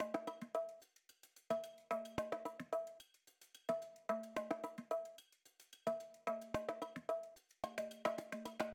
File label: music interactive; World of Tanks